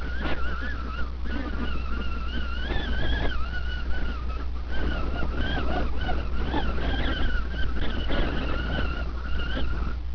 wind; sadhill

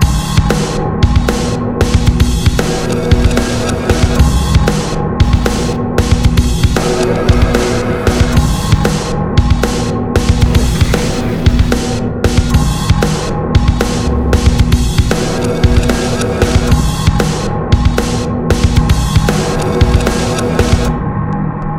fight loop; music; morass beta

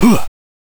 boxing deploy; antiroxx

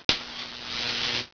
bzz

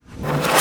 mr lamp; mystic radar

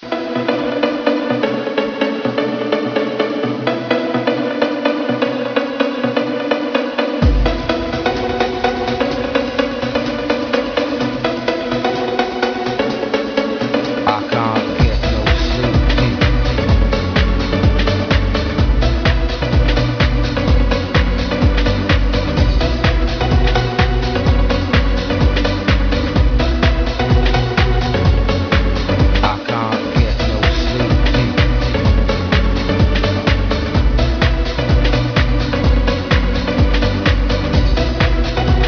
wintercold music

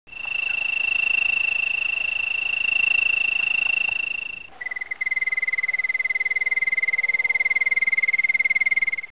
cricket; de drastic

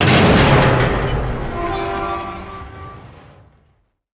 train accelerate

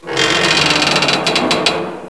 fog; de fog

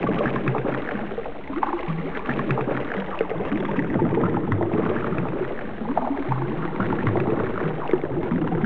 lava

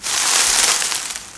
leaves

